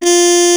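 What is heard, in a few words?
mute trumpet F4; MUTE TPT.; Greytsounds; Scott Peers Sound Engineering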